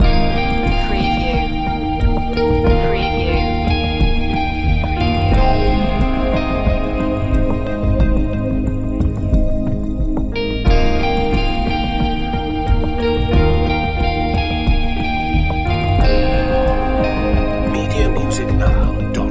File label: hidden beauty loop